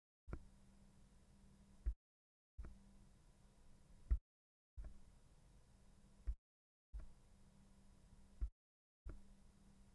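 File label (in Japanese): maono PD100X ミュート操作音, ミュートON/OFFを4～5回繰り返し操作しています; ダイナミックマイク; maono ダイナミックマイク：ミュート操作音の確認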